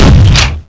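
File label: shotgun; dmc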